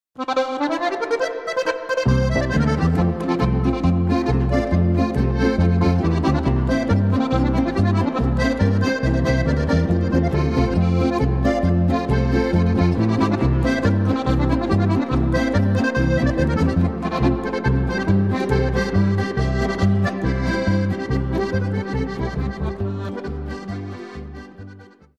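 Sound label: floit n polka, Steirische Harmonika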